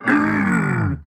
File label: stomp; CrossCode; boss; hedgehag